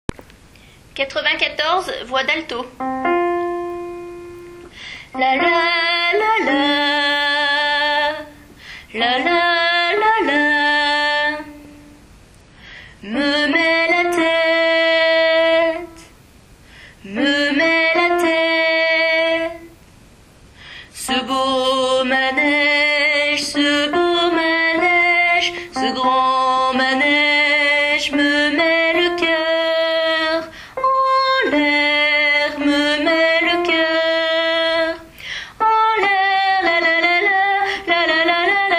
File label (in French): Alto